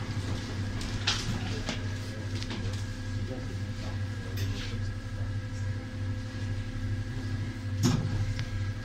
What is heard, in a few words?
ambiance, Metro